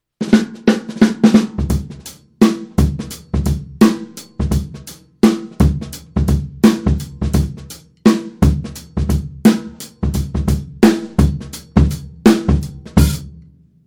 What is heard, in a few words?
kickport